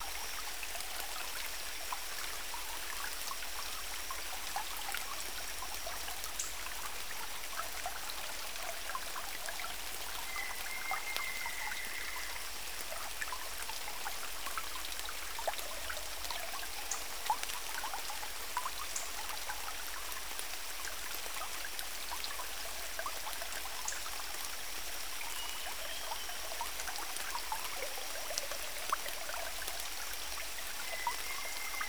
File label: amb rainforest rain lake; chicory a colorful tale; ps4